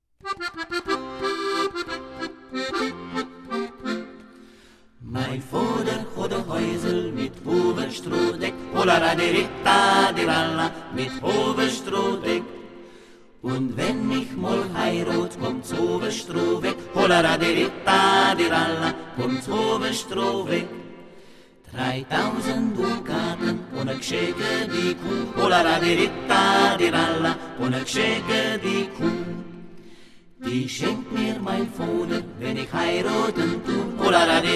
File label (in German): Quartett